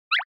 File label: Motion Pop